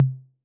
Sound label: Tom Mid B 808, Clean, Digital